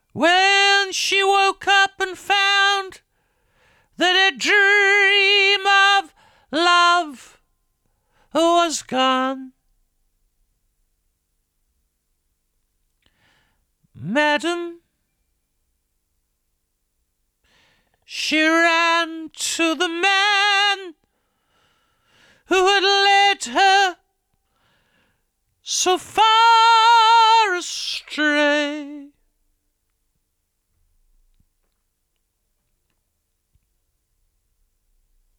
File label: Vocals 2; ATTACK 12 RELEASE 9